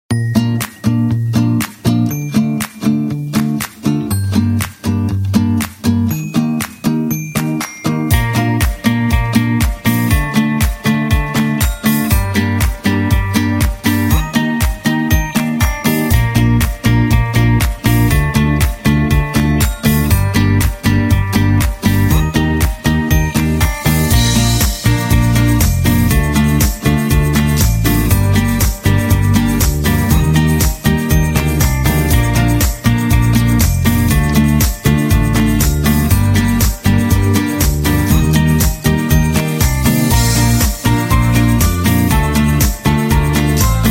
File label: Jingle